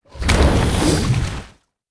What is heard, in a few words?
impact water; Quantum Redshift